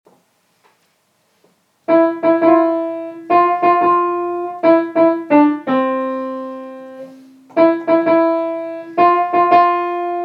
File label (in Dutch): sopraan